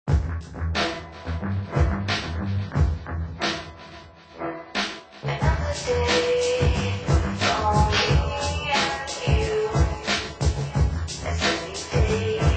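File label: Music Ambient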